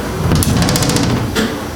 door open vibrate

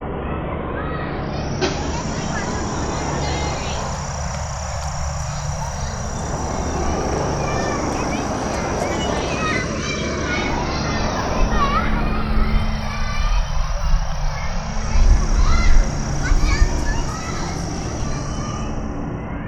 Children

